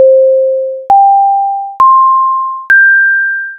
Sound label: pinpon